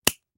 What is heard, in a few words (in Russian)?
zvuki mashinki dlia strizhki volos